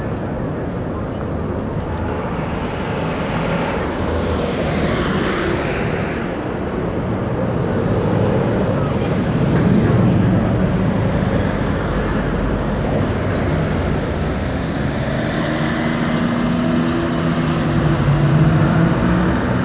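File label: big city ambience; valve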